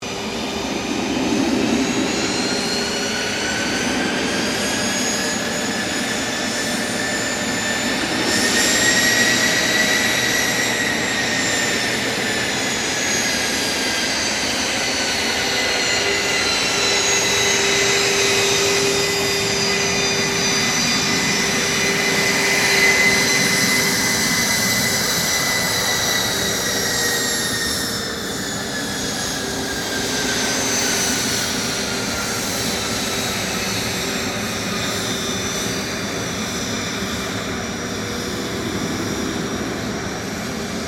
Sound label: Cessna Citation CJ3 preflight; Airplanes; Vehicles